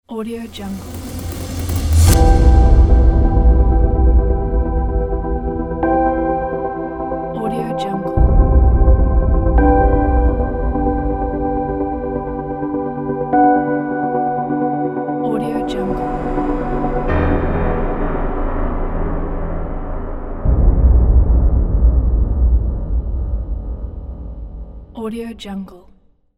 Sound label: inspiring intro ident